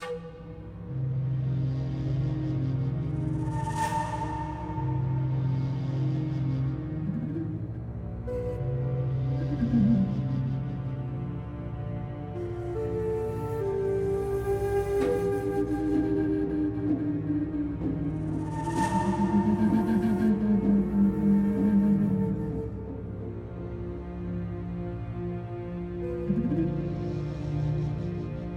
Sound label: Ambience Music; Padana Ambience Music; bless